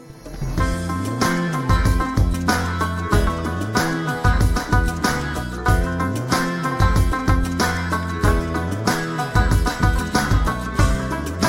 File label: Rabab and Guitar